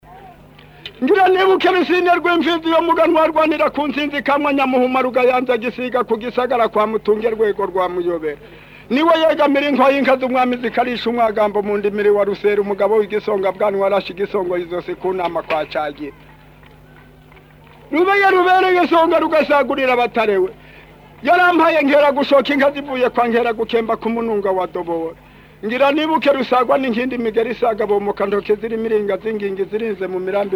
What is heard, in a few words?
amazina inka, burundi